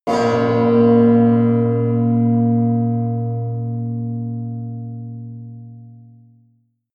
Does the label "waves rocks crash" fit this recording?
No